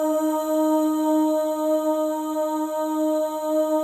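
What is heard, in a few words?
OH, female OHS, Voices; Spectrasonics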